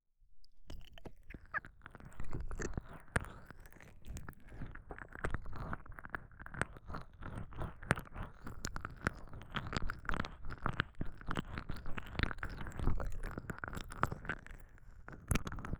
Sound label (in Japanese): 舌先で耳奥れろれろ耳舐め, ASMR; お口の音フェチ; 濃厚な耳舐めとマウスサウンドが心地良い癒やしのASMR♪